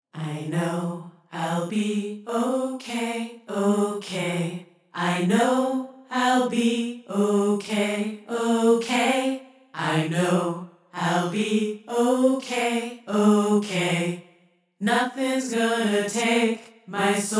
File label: Vocals